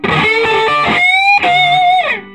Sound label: SOLO, Vintage Blues Guitar